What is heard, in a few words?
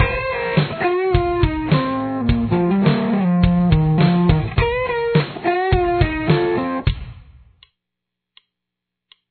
intro guitar